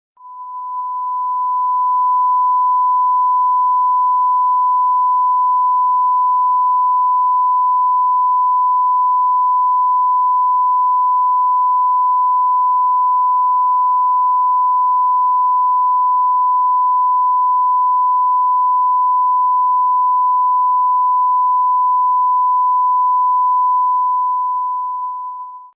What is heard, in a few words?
SMPTE bars and tone